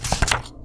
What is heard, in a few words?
Paper Flip